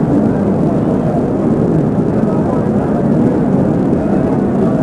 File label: Large Crowd Idle; David Beckham Soccer; XBOX